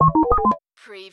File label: Servo Panel Sound - Access